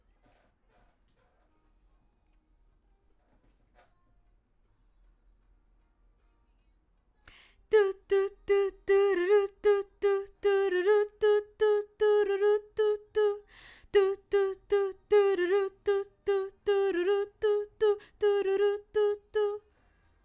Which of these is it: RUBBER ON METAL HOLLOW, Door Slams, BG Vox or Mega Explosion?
BG Vox